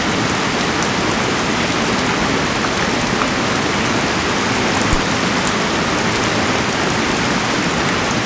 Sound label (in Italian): Pioggia, natura